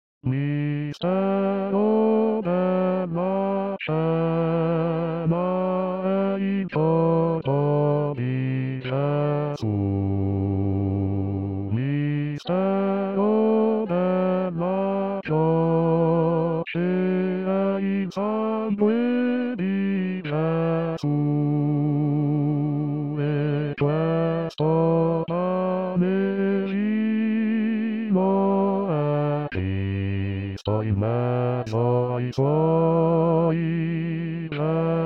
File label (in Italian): Bassi